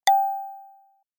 light, notification sounds

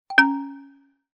notification pretty good; application user interface ui sounds

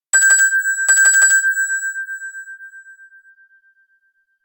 sky high pitch, ringtones; standard ringtones

